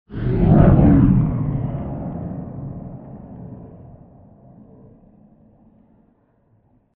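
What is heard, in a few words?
sounds of comet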